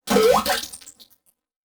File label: Digital; Adobe; Multimedia Internet CD-Rom Flash